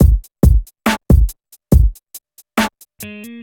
Bpm70 Int L; Appare; Mojibribbon; PS2

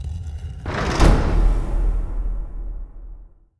Switch Gate Gate; gamerip

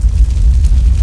Poison Balls; Go Poison Balls

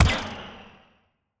Harpoon attack; gamerip

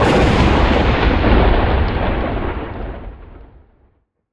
Dark Magus Thunder

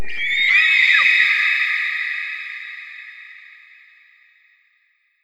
Elementaliste Skill Eagle

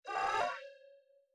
aquarium boss voice Warning; unsighted; enemies

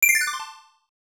coin; Print Club 2 Vol 8 '97 Summer

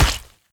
Hit Weak; Legend of Keepers (gamerip) (2019)

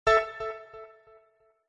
Basketball

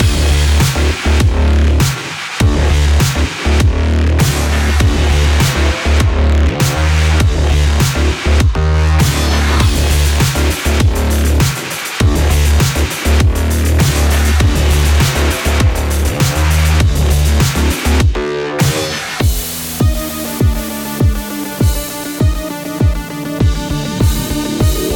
Music Boss Scifi; rabbids multiverse; iOS, macOS, Mobile

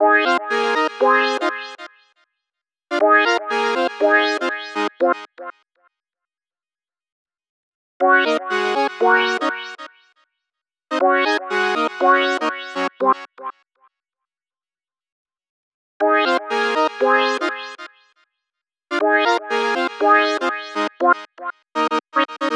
Plaza Ambient; gamerip; Splatoon; Inkopolis

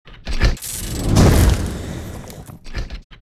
Incineration

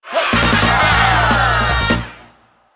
Jingle, Record Broken; rayman raving rabbids playstation 2

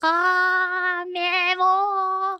Fade Away; Hollow Knight; Moth Seer Fade Away

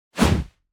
Whoosh, Sound Design, Airy, Full, Forceful, Soft; enemies

